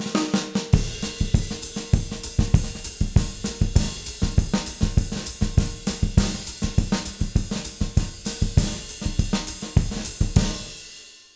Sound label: 200 SAMBA, Jazz Latin Drumloops